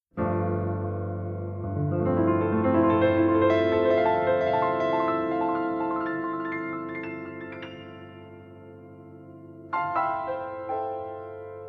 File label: Lovers Waltz